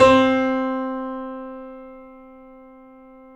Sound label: C, The Piano; InVision Interactive - The Piano